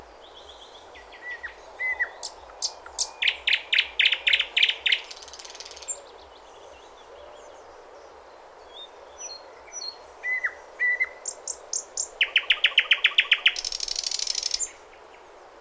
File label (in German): Sprosser; Wuerzburg WUe; Rainer Jahn